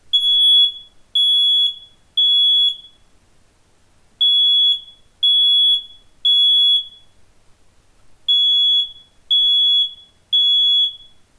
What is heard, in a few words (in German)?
gira basic q rauchmelder alarm